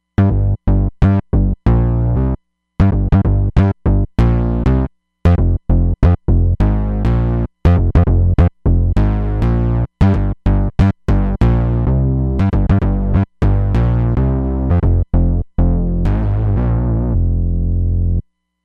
Saw&MG Bass; Roland Fantom XR

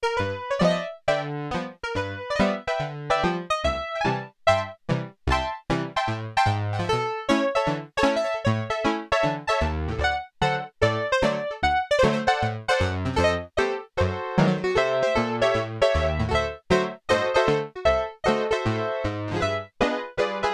OLD TIME PIANO, SIEL DK 80; Factory

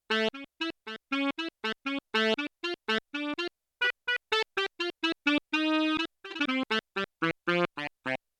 Alto Sax Chorus, Yamaha TX16W; Alto & Soprano

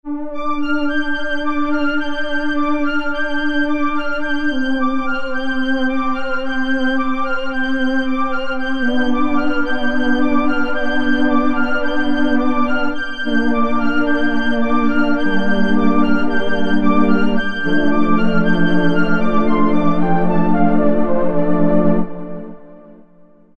Strings; Cheetah MS6

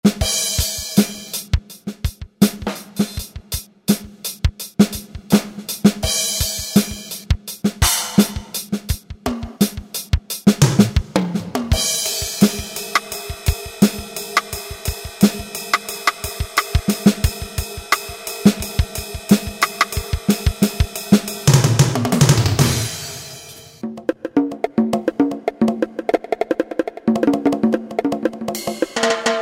Drum Kit

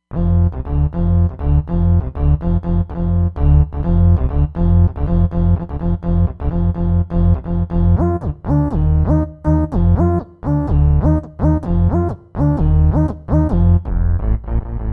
Party Bass, Roland Fantom XR

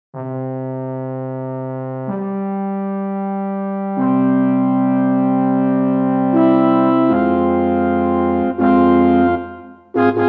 French Horn, Roland JV-880; Factory Patches

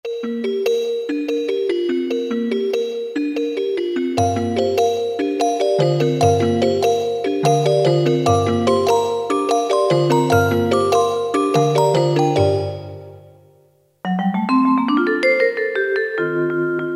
Chr Perc - Pipe, Roland EM-10

